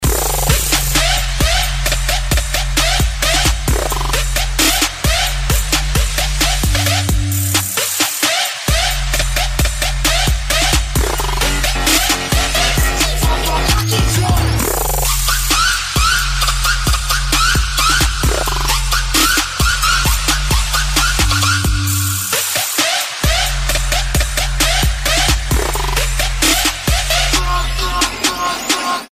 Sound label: Trap & bass mix bass boosted